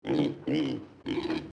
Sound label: pig; zones; agri